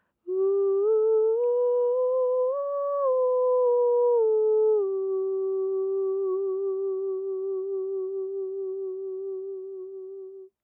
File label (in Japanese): uragoe